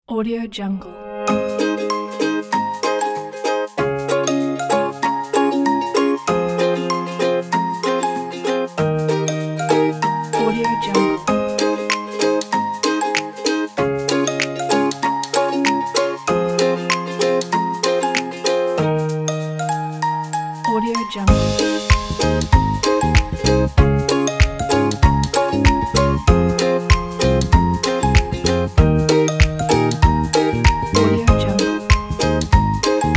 acoustic ukulele cheerful music pack

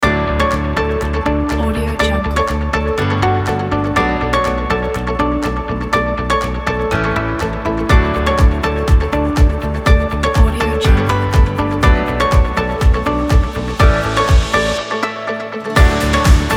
instrumental kit; red ninja

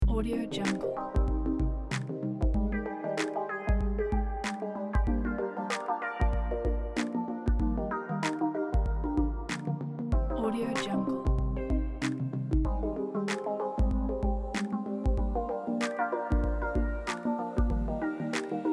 tropical beach modern tech

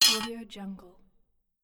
sword drop

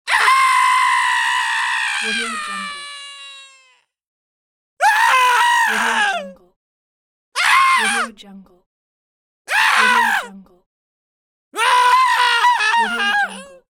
male screams; applehillstudios

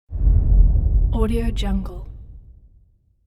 distant bass drum whoosh; hollywoodedge

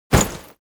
foley medieval step leather heavy; smartsoundfx

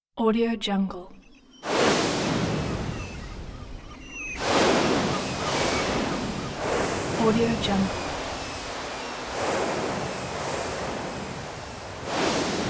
beach ambience with seagulls

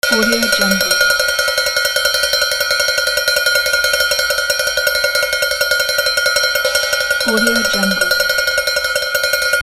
bell alarm

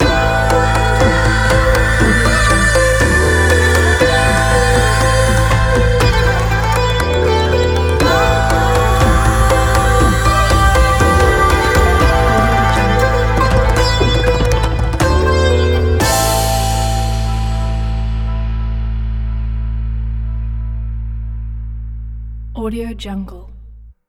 india travel ident; andyslatter

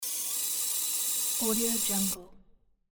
dentists drill

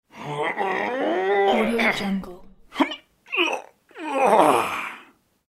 man exertion grunts and groans

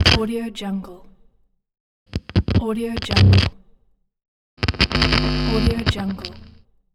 guitar connect

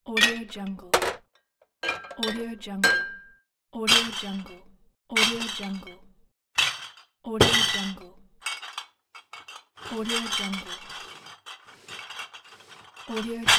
bottles; spillaudio

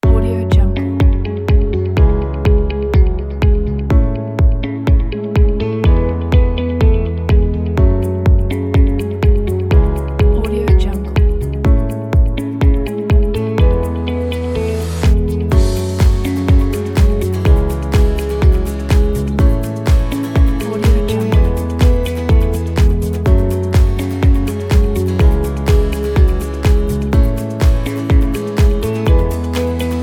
inspiring upbeat corporate

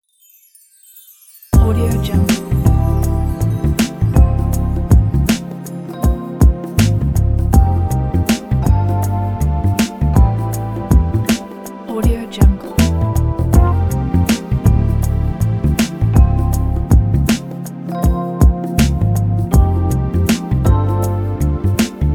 neo soul

